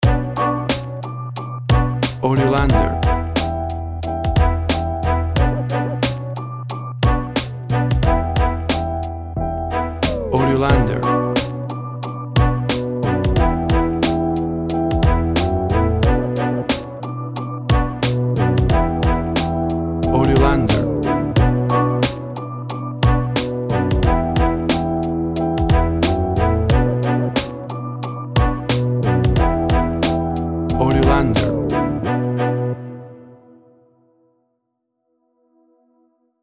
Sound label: West Coast Hip Hop